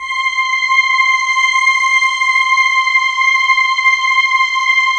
3D ream Voice Pad; E-MU Producer Series Vol. 5 – 3-D Audio Collection; 3DSynths

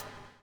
BUBL; Spectrasonics - Backbeat

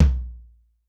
Dry Flr, Tom, Yamaha DTXpress II

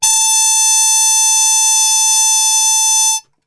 A 3, Brass Super Section, TRP HARMUTE; Best Service; 90 s Sample CDs

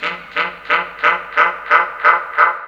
SAX FX; Ragga & Dub Reggae; BRASS KIT; AKAI; USB Soundscan vol.27

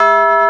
TUBULAR E3; CMP - Analogon; RESO

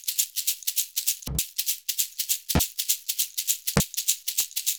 SHAKER, Modern Percussion Loops; Soundscan vol.56; AKAI; SHAKER100

